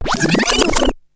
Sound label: tape; BEAT2000